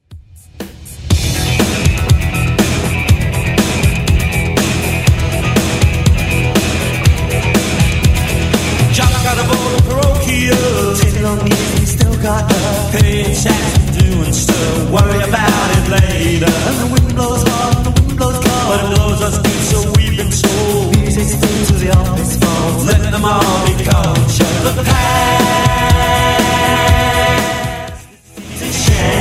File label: 90s Rock 121bpm